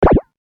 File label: btn click